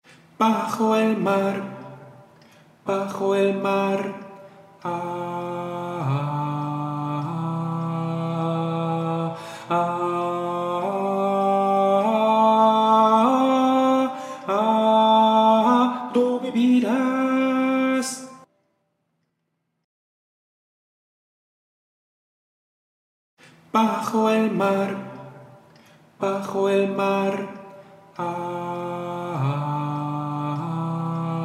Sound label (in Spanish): sirenita soprano; LA SIRENITA